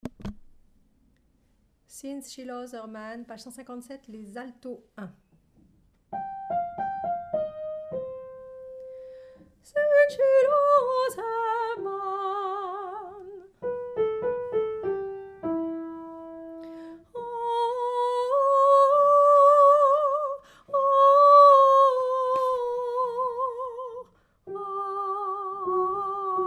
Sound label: Alto